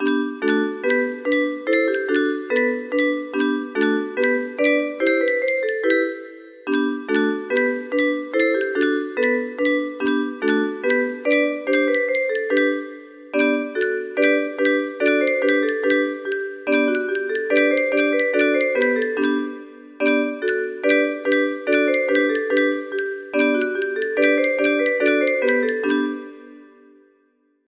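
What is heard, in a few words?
gavotte, music box melody; p fr caroubel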